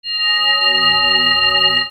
BELLS

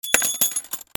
INDUSTRY

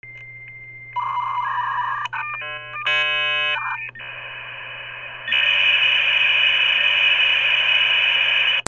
PHONES

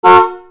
CARS

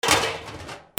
KITCHEN

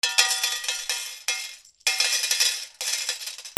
MONEY

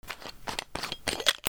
BATHROOM

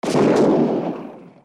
WEAPONS

